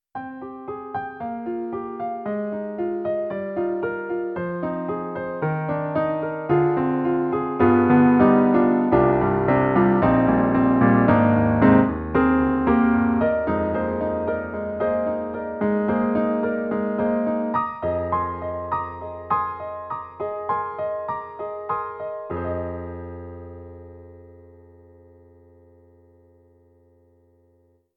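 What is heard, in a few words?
Perfect Piano; E-MU Sounds of the ZR Expansion ROM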